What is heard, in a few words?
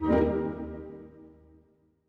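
orchestra; Masterbits - Switch FX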